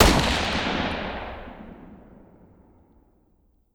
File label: fire dist; weapons; m16; arccw ud